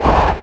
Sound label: crawler damage; Barotraumatic; Cthulhu; InsanityMonsters; Hallucination1